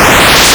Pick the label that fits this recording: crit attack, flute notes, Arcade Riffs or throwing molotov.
Arcade Riffs